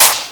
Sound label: console fail